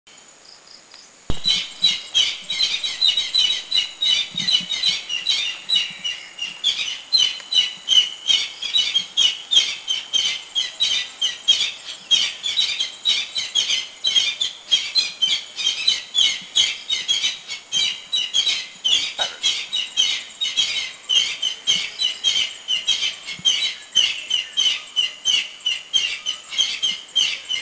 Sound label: Luzon Hornbill; Philippines